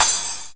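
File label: 909 CRASH; Groove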